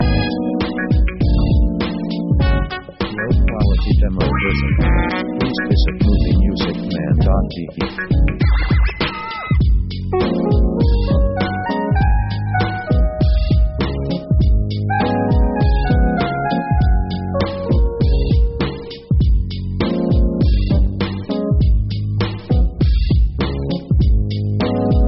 hip hop swing

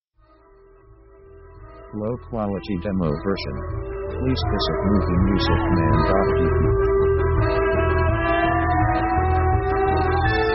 gipsy band

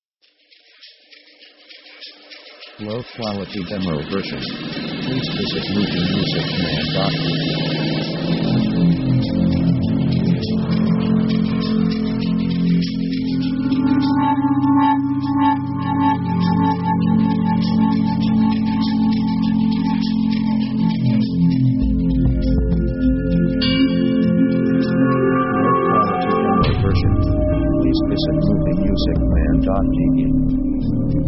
synth gothic metal; synth themes

